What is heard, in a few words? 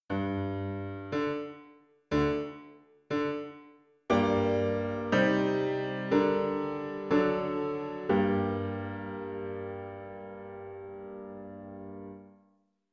Piano